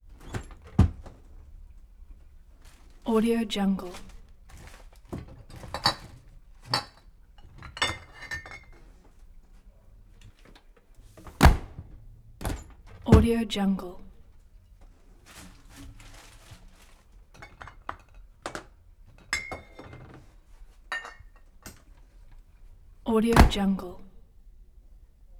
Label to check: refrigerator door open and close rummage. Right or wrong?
Right